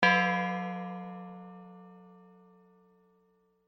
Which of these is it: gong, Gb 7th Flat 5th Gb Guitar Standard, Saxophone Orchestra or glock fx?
gong